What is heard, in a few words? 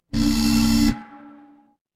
feedback buzzer